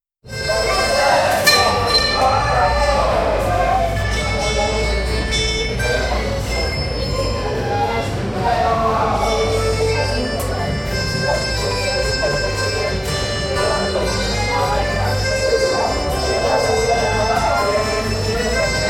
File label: ambience village tavern